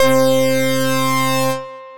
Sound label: Lead; Lit Lead; Synth Lead; Ableton; Beat Tools